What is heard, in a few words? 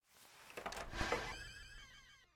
Open Glitch Door; Game rip; MiSide